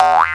Sheep; breakout; farm

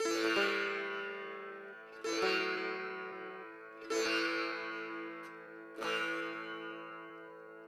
SITAR ETHNO 125; eJay; Guitar

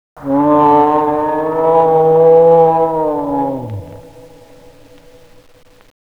Scream Low; MACLIFE; サンプルサウンド集

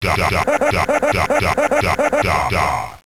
die haha

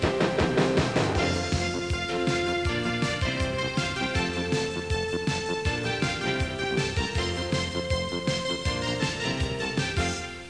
Musik Loop; TKKG 2; ausweis

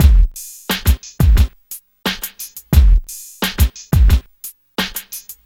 drum; 88 BPM

